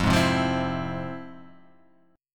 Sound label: F Minor Major 7th, chord